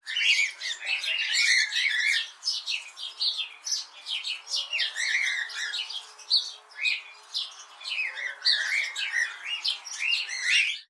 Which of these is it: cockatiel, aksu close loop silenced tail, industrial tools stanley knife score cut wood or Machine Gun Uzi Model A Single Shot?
cockatiel